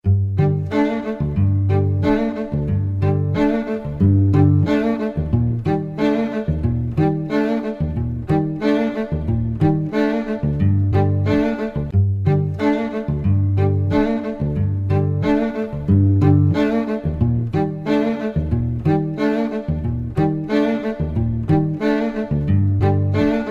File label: Instrumental